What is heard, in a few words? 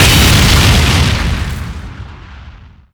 Mvm sentrybuster explode